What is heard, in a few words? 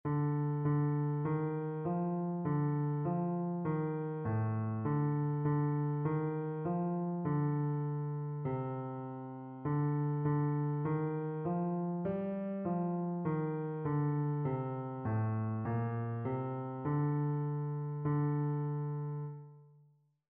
mode identification